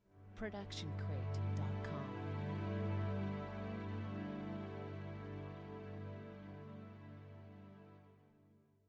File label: feel good strings short chord; soundscrate